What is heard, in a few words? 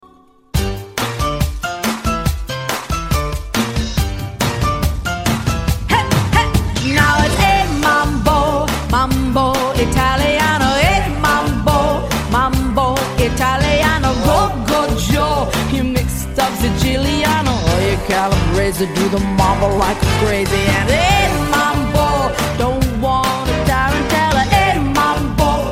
Routine Set MUSIC; artistic swimming